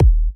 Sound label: soft hit whistle